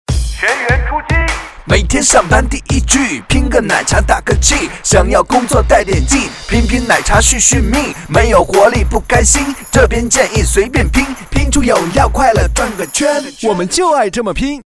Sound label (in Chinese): 说唱; 拼奶茶